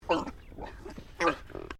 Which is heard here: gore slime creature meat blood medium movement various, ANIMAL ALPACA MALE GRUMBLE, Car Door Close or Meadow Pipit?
ANIMAL ALPACA MALE GRUMBLE